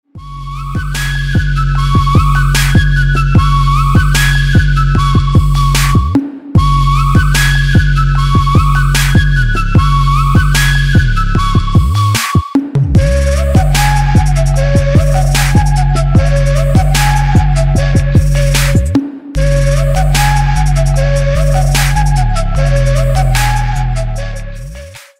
magic flute

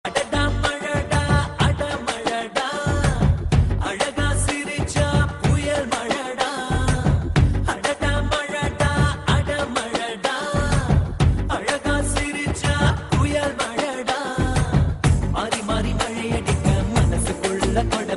male

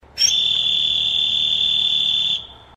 warning alert

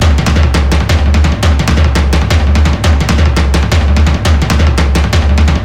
horde drums; ringtone

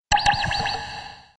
sonar

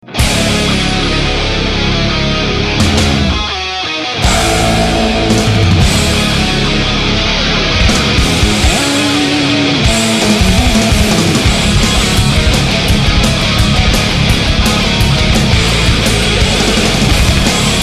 heavy metal; saint seiya

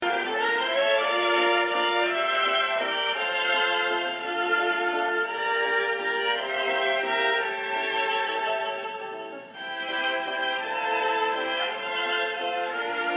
merry waltz

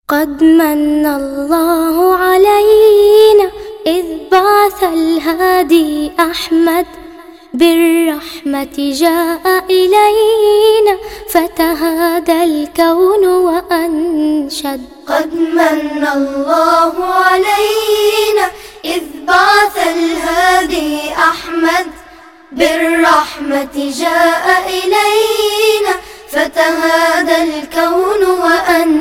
cute arabic nasheed

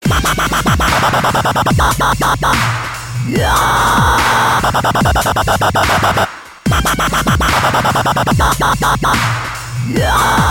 annoying dubstep

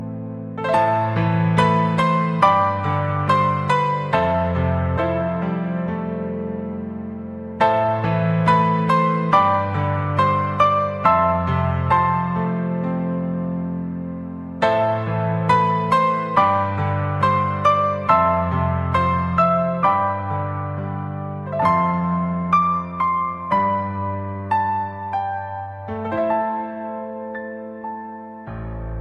another piano

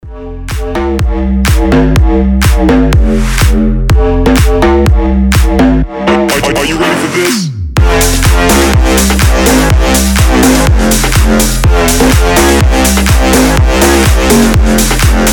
brass

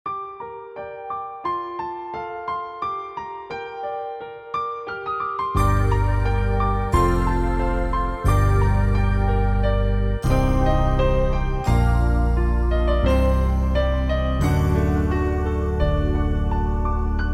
fortepiano; ringtone

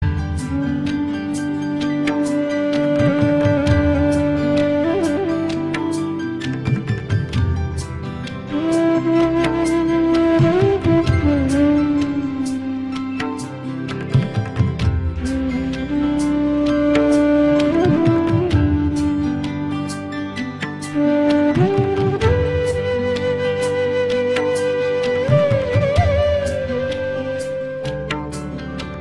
hare rama flute

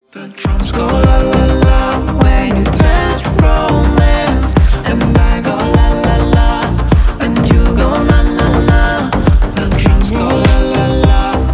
drum song

